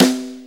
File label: Brush Snare; Sonic Foundry; Loops & Samples Drum Tools; Brushes